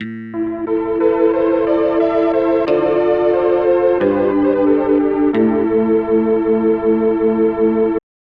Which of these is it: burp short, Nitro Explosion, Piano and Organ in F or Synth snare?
Nitro Explosion